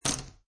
Poker Chips Place Stack Of Chips On Another Stack Wood Table